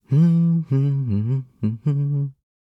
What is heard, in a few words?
voice humming